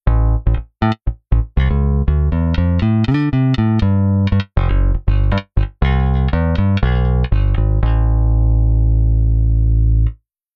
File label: Bass Front